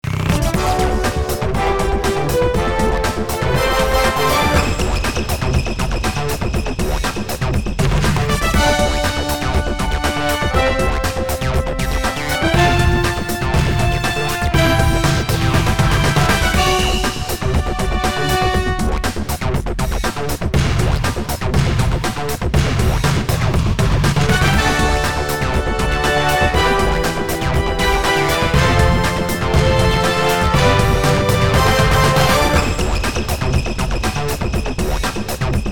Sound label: Broadcast Outro; NCAA Basketball 09; PS2, PS3, Xbox 360